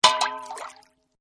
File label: Waterdrop; Water Sounds; Sound Effects:Nature